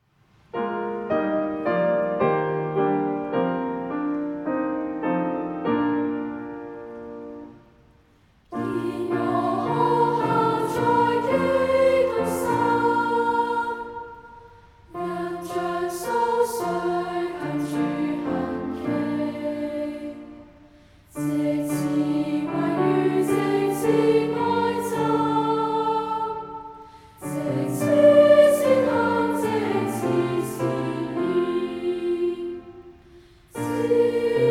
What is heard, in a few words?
School Hymn